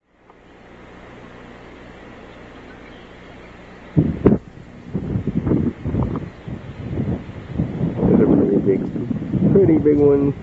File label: gator